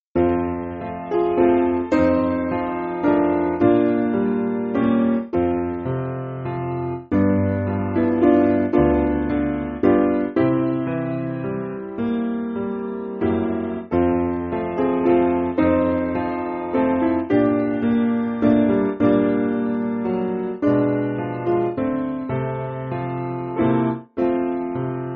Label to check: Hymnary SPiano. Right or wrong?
Right